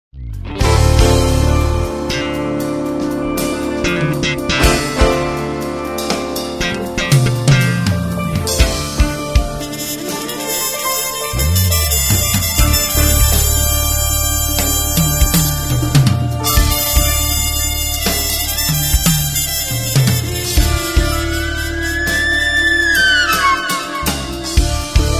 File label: Calling All Congas